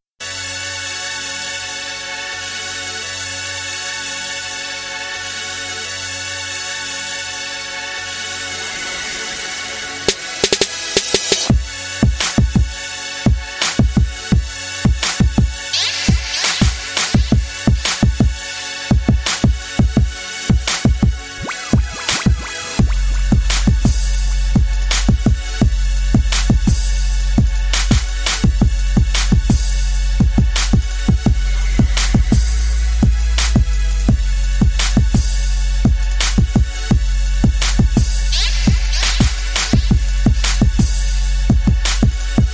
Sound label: convertable no vocal